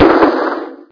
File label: sound cannon; replicaisland; android 5.1.0